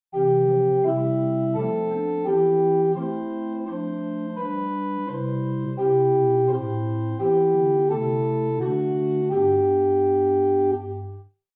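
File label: Great Bourdon Pedal Choralbass; First Baptist Riverside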